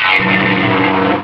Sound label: energy column; Drunken Robot Pornography; Inago Rage